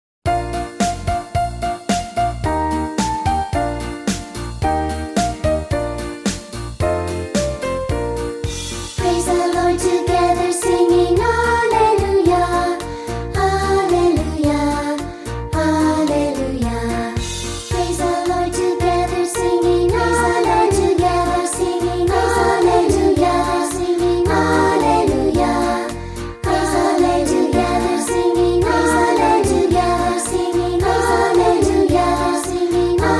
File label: Childrens Choir